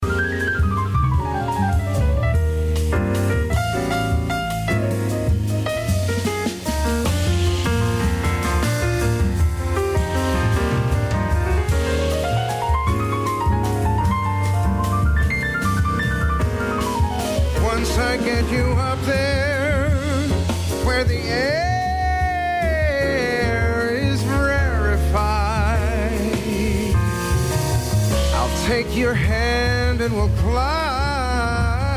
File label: jazz